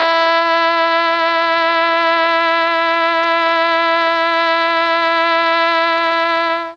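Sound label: E3 trombone trumpet, mellotron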